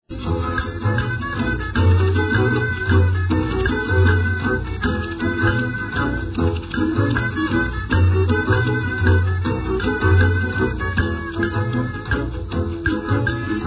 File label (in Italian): Orchestra Jazz